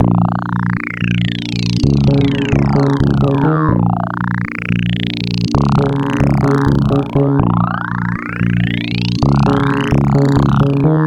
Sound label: Troll Synth